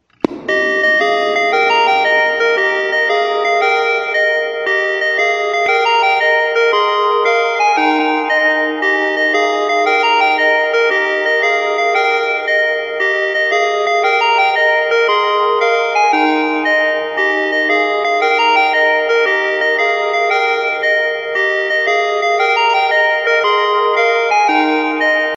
Pop Goes The Weasel Ice Cream Truck Version Digital